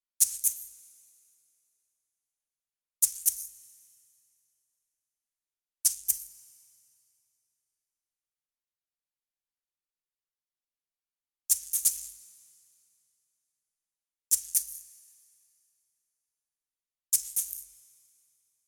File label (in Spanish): shaker